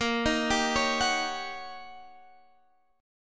D sharp 7th Sharp 9th

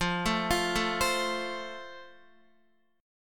F Major, Guitar; arpeggiated

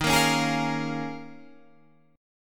D sharp 7th Suspended 2nd Suspended 4th D sharp; D#7sus2sus4; strummed; 11,13,11,13,11,13; chord shape

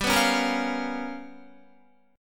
G 7th Sharp 9th Flat 5th, Guitar; JGuitar; strummed

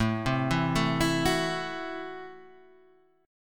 Gb Minor 7th Flat 5th, Guitar

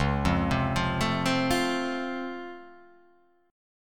C sharp Augmented Major 7th down, guitar chord

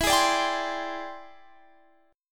E 7th Sharp 9th Sharp 5th, chord

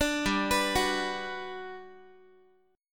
G Major 7th, Guitar; GM7 arpeggiated; 17,15,14,16,x,x

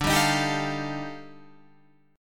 Eb Major Sharp 11th D

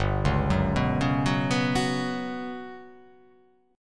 G Major 7th Flat 5th

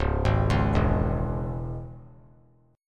C sharp Minor, chord; C#m; arpeggiated; JGuitar

